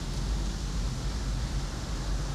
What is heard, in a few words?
Ambience Loop, outdoor